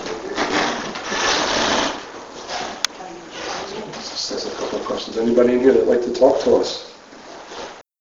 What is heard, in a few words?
evp click